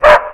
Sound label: Dog Bark Four; DogBarks 1.0